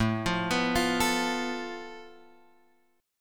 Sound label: A Suspended 2nd Sharp 5th, Guitar, Drop D